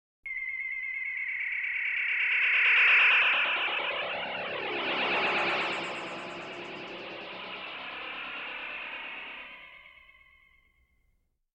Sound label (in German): elektronenenergie; Science Fiction